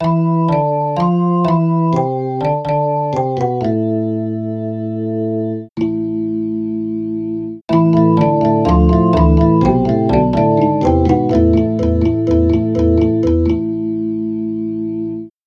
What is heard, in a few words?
percussive organ samples